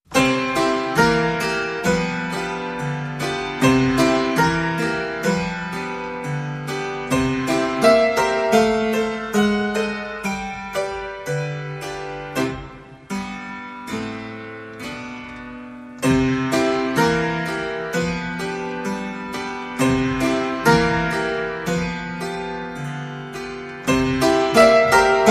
Old Time Piano Man

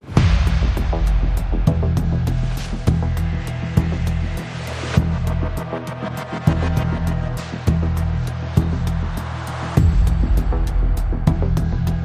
No Piano Chords

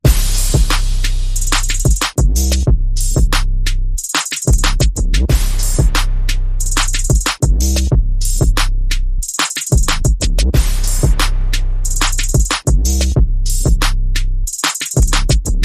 Bass and Perc

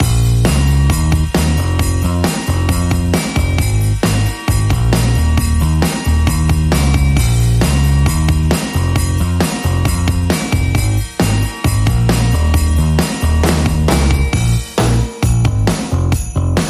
Lofi Christmas Chill Rhythm

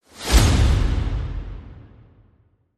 Holiday Action Whoosh

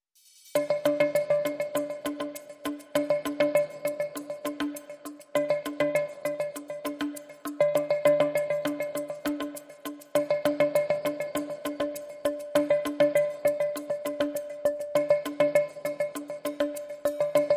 Something Going On Drum and Bass

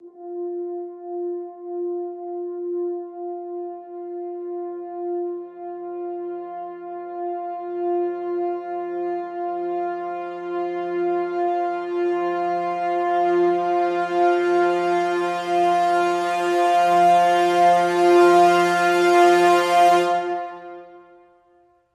Brass Swell Hi (F)

